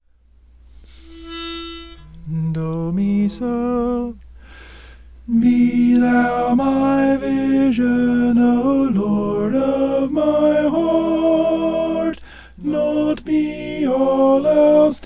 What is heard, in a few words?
alto